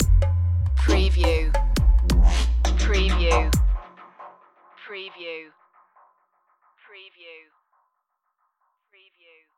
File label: darkness stinger